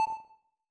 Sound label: ultrasound heartbeat; Cozypaw-Hospital